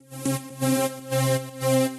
Pad

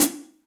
Hihat Foot Direct Natural; Drum Hits; Standard Hits; Ableton; Factory Packs; Session Drums Studio

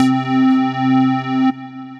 Squared Up Solo, synth lead; Ableton; Factory Packs; Singularities

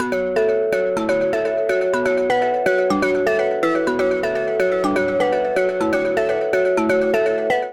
Mallets Bm 124 Bpm; Bouncy Castle

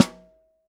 Top, Snare

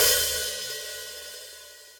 Hat Open; hihat; drum hits; Ableton; Factory Packs; Drumdrops Recording Hybrid Kit

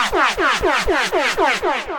Hurt Move Synth; Effects; Ableton; Glitch and Wash

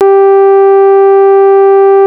Theremin Vintage; Ableton Foundation FreePacks; Foundation Atmospheres

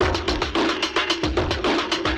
110 Chill Pop Drums; Pop Rock

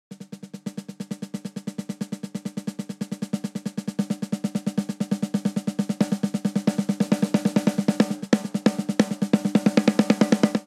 Swing Hip Hop Snare Only 90 bpm; 4 4; MIDI Clips; Ableton; Factory Packs; Drum Booth

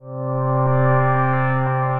Formantising Pad; Instruments; Ethereal Pads; Ableton; Mood Reel

